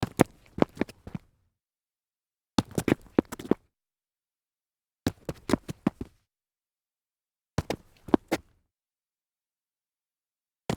stumble concrete

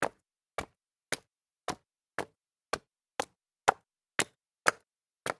walk up concrete stairs

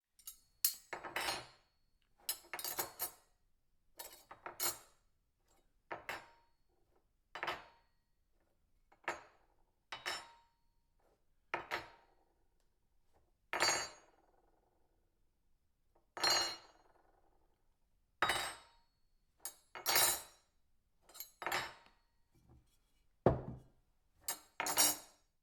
silverware on table

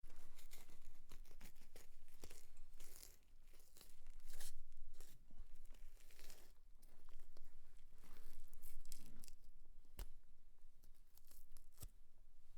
cutting flesh

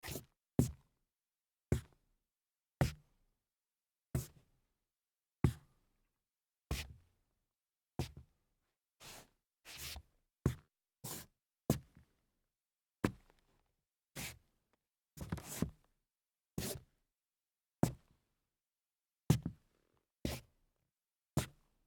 shoe scuff hollow wood

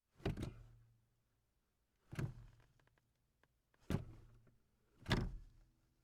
car brake pedal

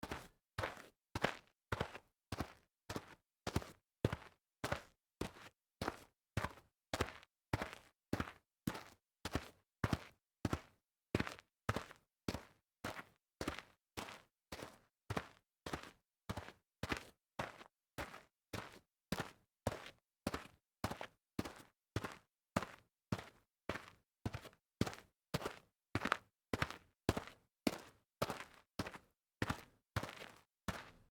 walking fast on dirt

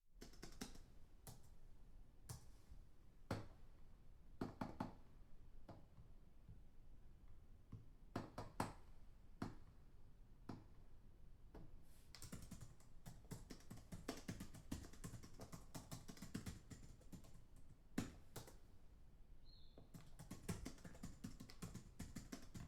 keyboard typing fast far

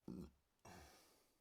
human burp

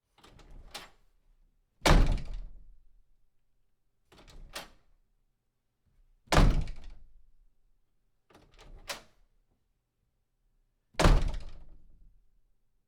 wood door open close hard

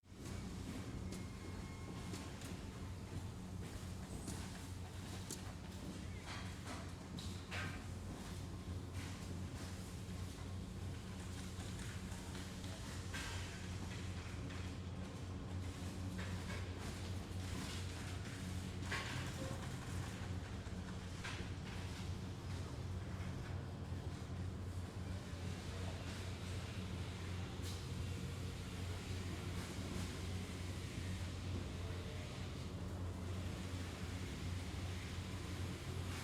factory background

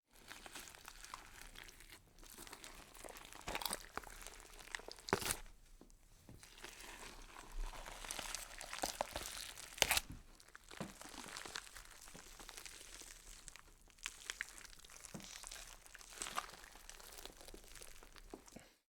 wet meat twist and rip

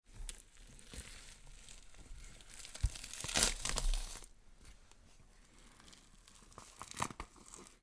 peeling skin from bone

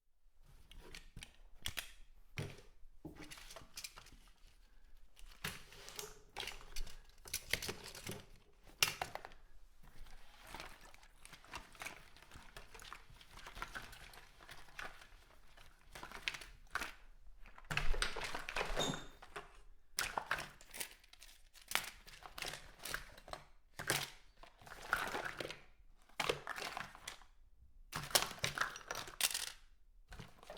searching bathroom cupboard

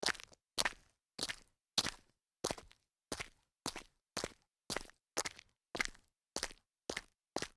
walk on gravel

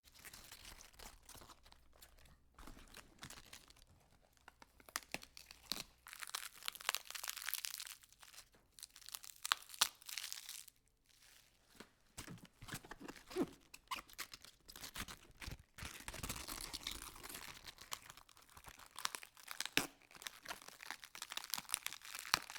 lettuce peeling

